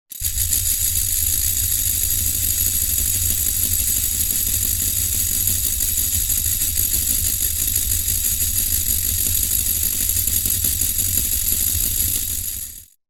Small Engine; Soundzabound

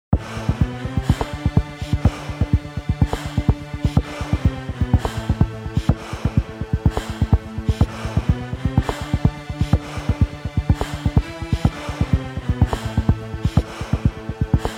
Breath; Commercial, Corporate

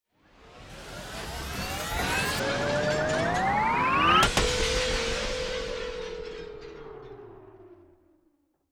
Ascend Motor Rev

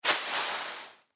ball drop in grass

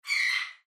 bird squawk

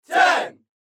Crowd

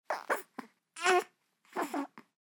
Baby Babble

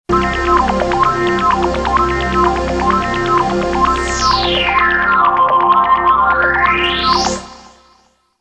Syntrans; Soundzabound

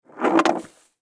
skateboard

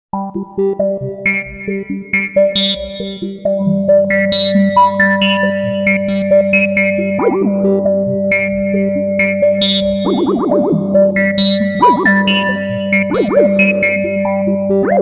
Alien Communication; Soundzabound Volume 3 Disc 2

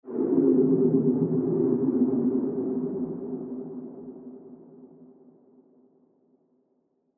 Eerie Reverb

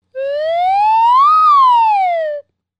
Slide Flute; Soundzabound

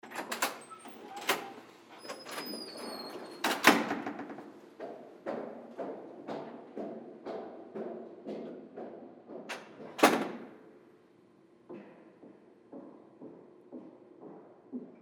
footsteps

